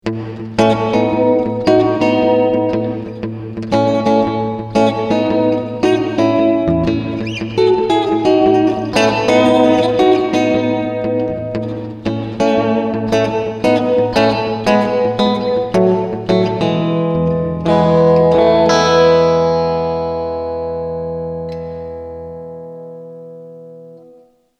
Room

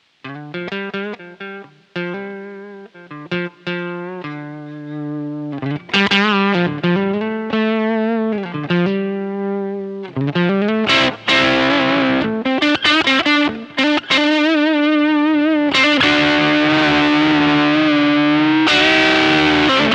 Electro Harmonix Lizard Queen Distortion Control

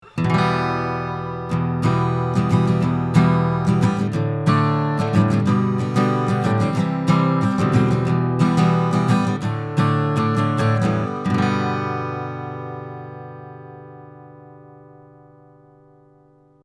Warm Audio WA-67 Guitar Strumming Cardioid 20cm

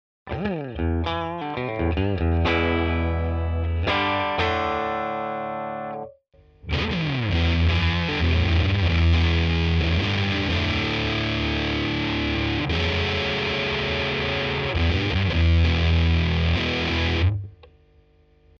Walrus Fundamental FUZZ Classic

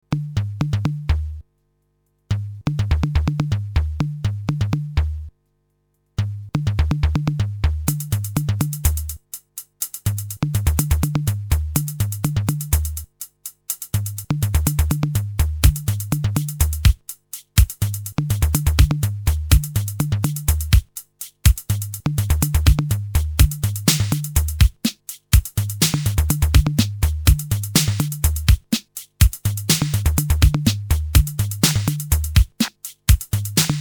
Drumstar 80 Electro

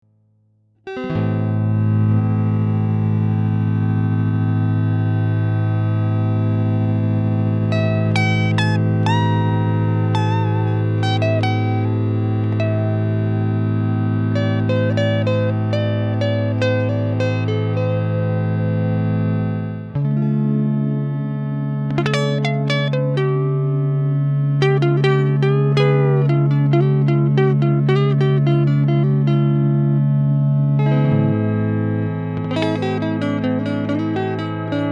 Momentary Mode; Superego

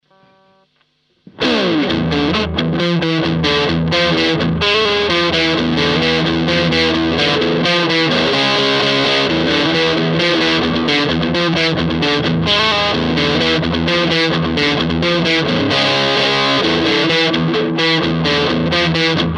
Sterling gain

